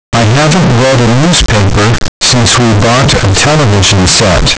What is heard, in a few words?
Clipped Signal